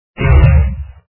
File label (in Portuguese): bola de fogo; anacroz